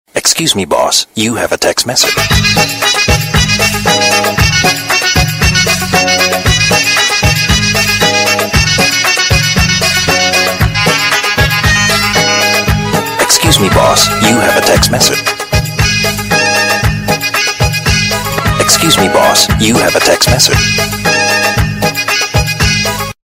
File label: apple boss sms ringtone